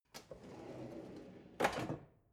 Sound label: drawer narrow close